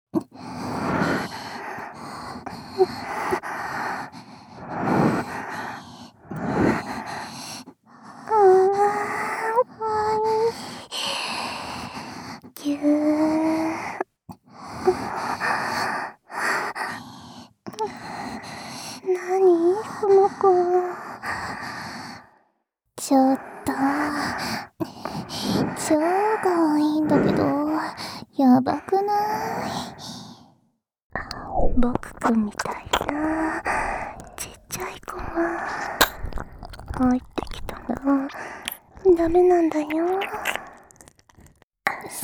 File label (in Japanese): 濃厚じゅりじゅり耳舐め SE無し; でちゅきちゅきメロメロにしちゃいま～す